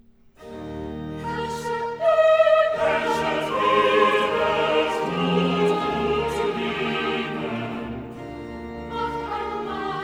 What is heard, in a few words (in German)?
Cantata, Choral; BWV 8 'Liebster Gott, wenn werd ich sterben'; VI. Choral 'Herrscher über Tod und Leben'; Bach; 16th Sun After Trinity